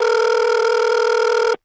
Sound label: Technology Electronic Cell Phone Calling On Speaker Phone